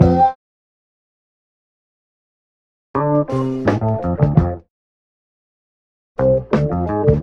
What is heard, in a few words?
keys, phonky loops